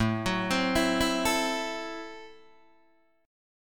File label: A Minor 6th A Guitar DADGAD